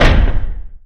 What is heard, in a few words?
Door Large Metal Stop; SFX Kit; Doors